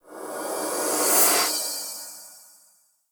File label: Transition Fade Swell; Warframe; The New War; Cinematics; Archon Intro